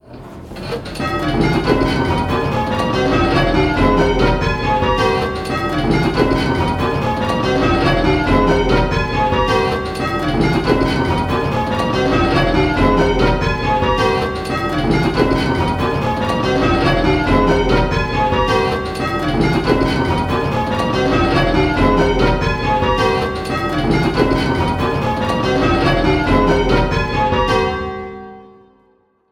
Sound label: Striking 10-Bell Call Changes; Pebworth